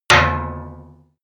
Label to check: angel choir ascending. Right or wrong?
Wrong